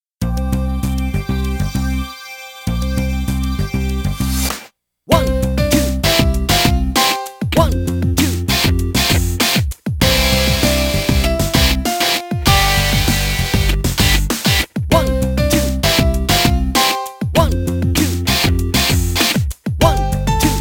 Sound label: Built to Scale on Strings; Rhythm Heaven; NDS